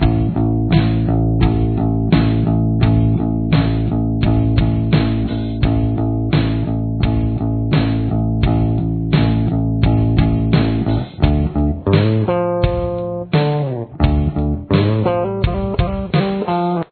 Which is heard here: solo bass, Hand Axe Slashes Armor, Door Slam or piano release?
solo bass